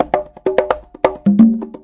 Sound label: 090 bongos, 090 bpm; Bazillions of Bongos